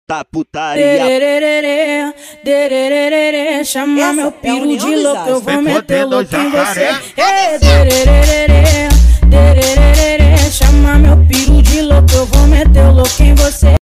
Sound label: funk rj